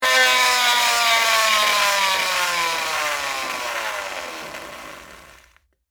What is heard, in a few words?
Bicycle Tire Spin, Slow, Down, With, Card; Texture; Spinning Sound Effects; Blast Wave